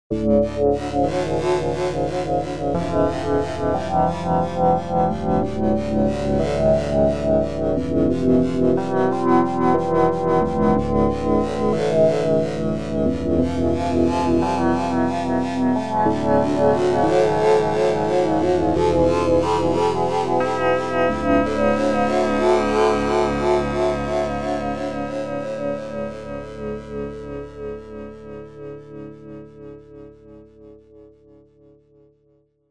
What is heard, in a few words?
multimode pad; opxpro